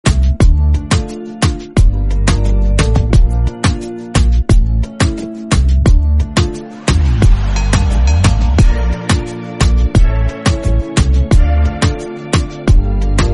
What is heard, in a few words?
instrumental song